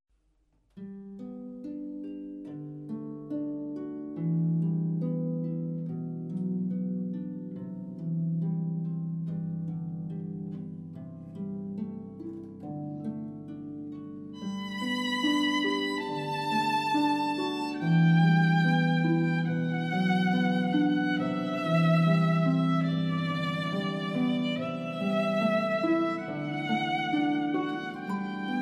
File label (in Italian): arpa violino; Pachelbel; Canone; matrimoni